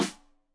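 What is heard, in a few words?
Snare Stereo, Drums; Deeply Sampled Drums; SMDrum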